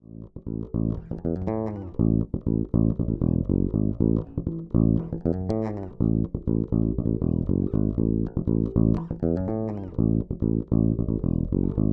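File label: Spector Bridge Pickup, bass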